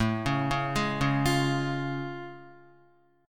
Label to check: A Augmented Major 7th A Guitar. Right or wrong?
Right